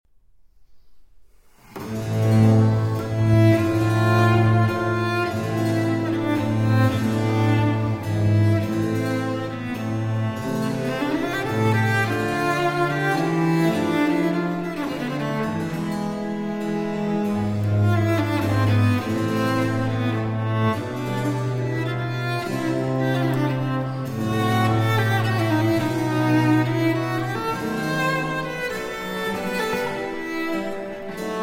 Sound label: Sonata For Cello & Continuo in A Minor I. Largo